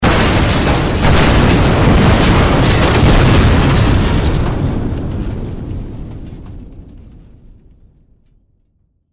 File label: Explosion Multiple Debris Blasts; Military; Warfare; Explosions